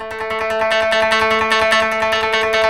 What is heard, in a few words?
KOTO TREM